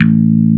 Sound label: PUMP BASS A2; Drums In Your FACE!